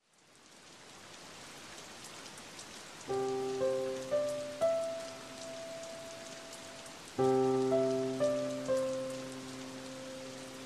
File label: Rain and thunder; با موسیقی